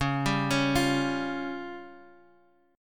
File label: Db Major Flat 5th Db Guitar; 9,10,11,10,x,x; 4E,4A,5D,5G,5C,6F; arpeggiated; chord shape